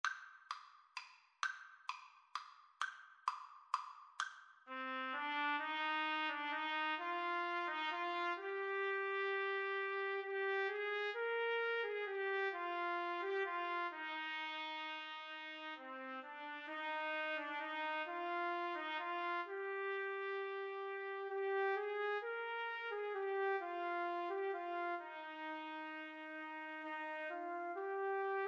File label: trumpet duet